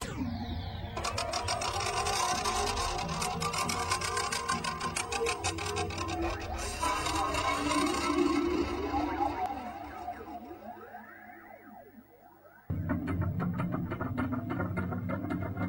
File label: AMBIENT